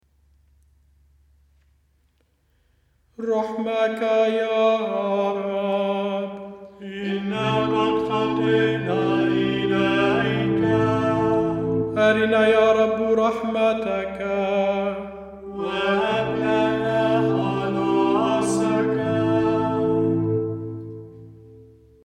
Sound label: Penitential rite Miserere; فعل التوبة لحن 2